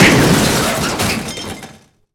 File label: freezer Cut; HeavenStudio; lumbearjack